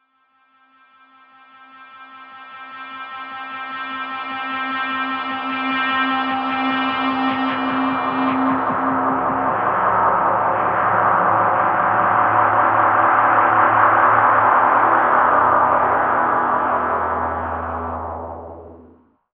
octave, guitars; Big Fish Audio - Alien Guitars; HOLY OCTAVE

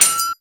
SWHIT, SWORDHIT; Total Control - Action Cutz

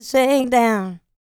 licks, vocal, dance; ILIO; Vocal Planet

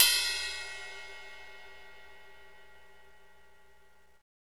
cymbal, Roland; Northstar; Drumscapes Roland; Cymbals 1

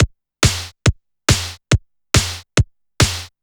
Addon Loop, Drum Loops 140bpm, Perc Loops

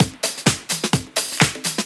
Latin Loop 128 BPM

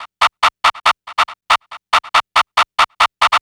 NRG Percussion, Trance, Drums; Sonic Foundry (Sony Creative Software); Trance NRG VOL-1

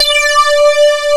SYNC D6, KORG, Analog; USB Soundscan vol.49; Analog Fantasies; AKAI

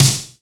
SD VRB, Yamaha RY-10

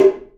DRUM; E-MU Producer Series Vol. 5; 3-D Audio Collection; 3D Multis; 3D Sprint Select 2

